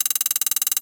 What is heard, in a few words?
metallic clicks